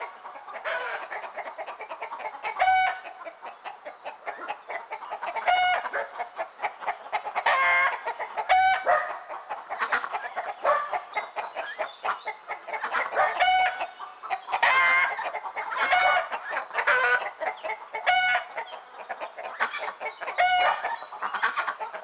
dog geese, Ambience; Project Gotham Racing 2

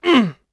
throw; UFC Tapout; XBOX

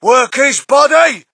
crowd, shout; Mike Tyson Heavyweight Boxing

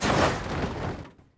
landing; Cars; XBOX

